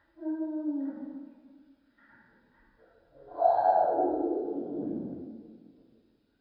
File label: bird budgie song; dfcaverns; df ambience